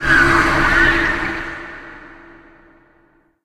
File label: souls receive; video games; source engine; tf